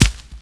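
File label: grass; Mafie 1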